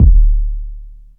classic nice steel kick drum sample d key, bass drum tuned to the D note